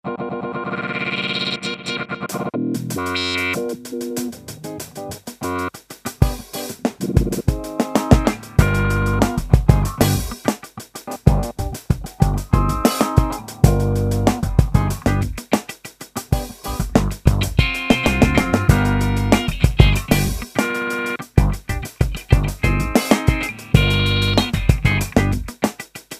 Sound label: desert roots funk